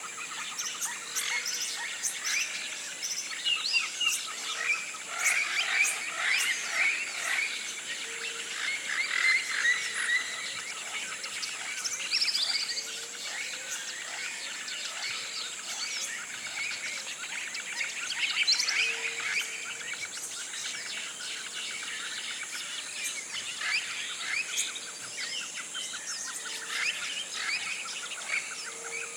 TROPICAL Rainforest morning rousing flocks of birds in the canopy